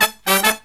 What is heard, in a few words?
Killer Brass Riffs, 108 soul